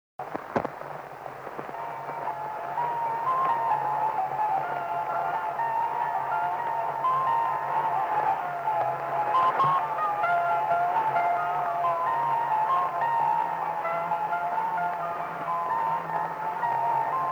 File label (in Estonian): Vene pahotka Setu kannel